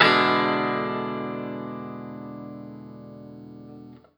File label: PRS CHRD 7TH, Guitar; 90 s Sample CDs; Quantum Leap - Guitar & Bass